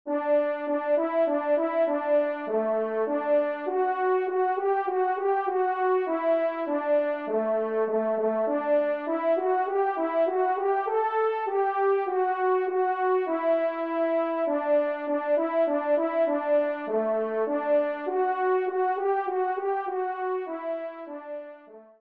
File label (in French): Menuet Do Maj TRP; 1e Menuet; Corrette